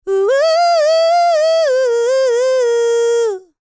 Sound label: LICKS, Vocal Planet R&B Dance; Spectrasonics; LT R+B